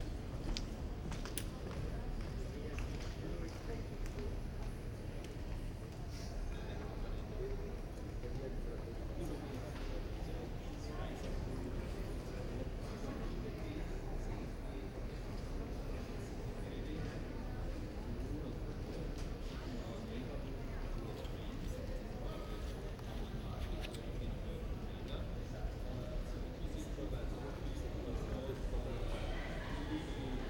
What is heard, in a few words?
Urban Large Building Square OUT Stereo Binaural